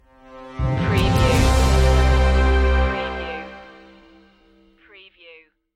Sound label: informative news stinger